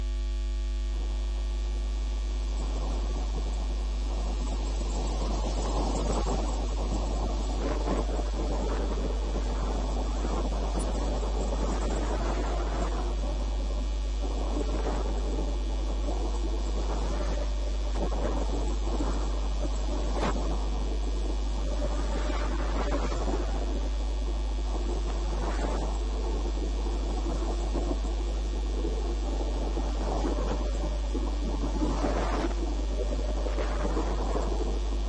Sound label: 4657kHz, radio; HouaPhanh Meo; IndoChina; Laos